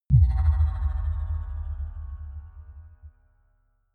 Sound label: sci fi bell bass